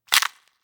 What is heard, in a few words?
Rocks Cracks Rock Crack Single